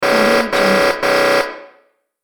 buzzed electronic repeat

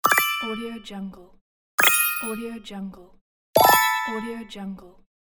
achievement pops; gamechestaudio

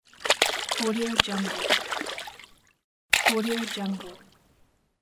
fish splashing

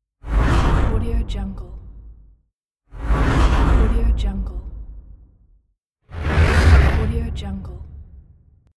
whoosh swoosh; avrmusic

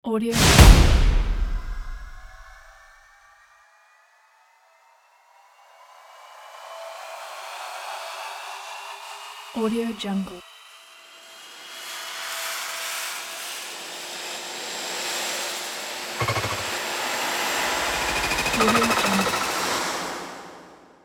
cinematic risers

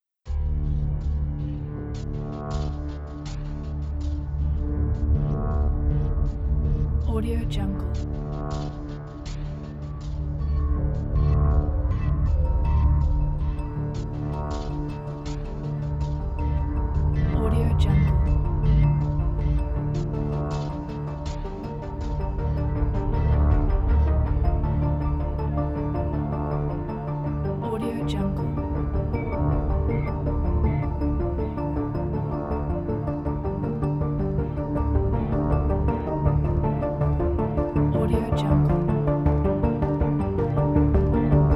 scifi preparation music

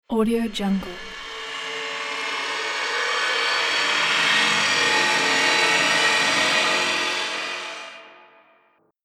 horror production element; sonic-boom

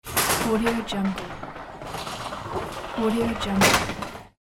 gutter ball return

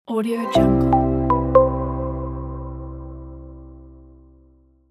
marketing logo

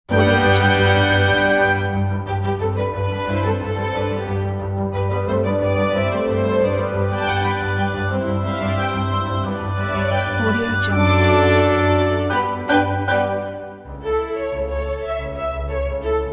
magic flute excerpt

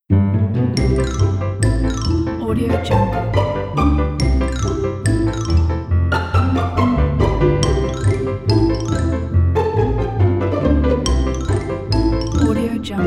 funny cartoon music comedy

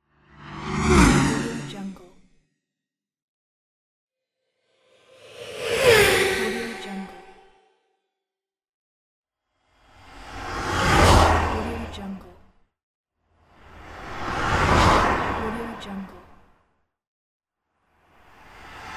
whoosh car

